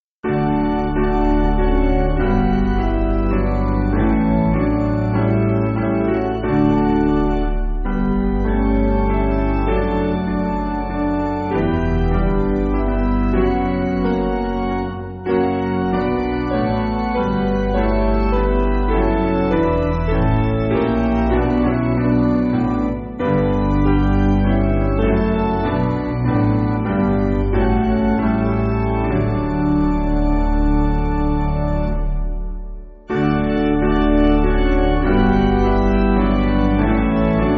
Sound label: Worship The Lord Piano